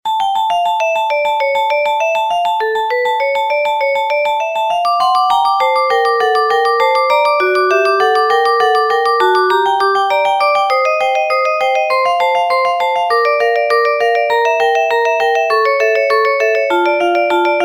Toccata, ringtones

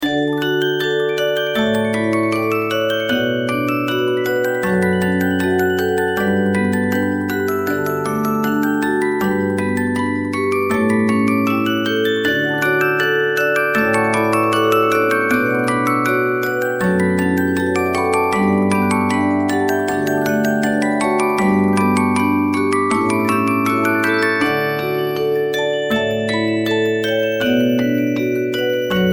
Pachelbel Canon; LG; SmartWorld Soundbox

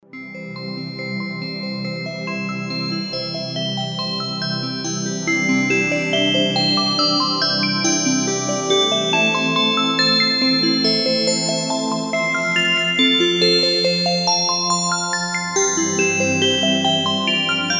Bell, alarms; Infinix; XOS 12

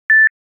Beep

